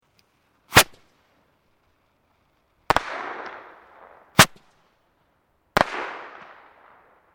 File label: Fireworks Skyrocket Double Bang, Domestic Fireworks